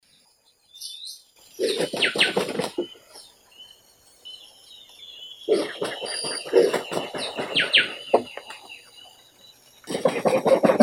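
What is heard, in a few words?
black vulture, Aegypius monachus; grif chernii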